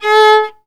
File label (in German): GEIGE; Best Service; Alpine Volksmusik